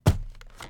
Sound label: wheat punch; PixelDefense